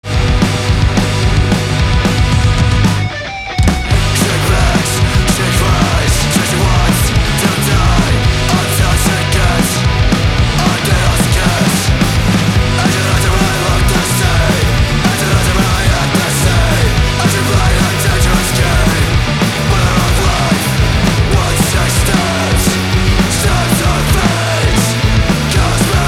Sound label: Hardcore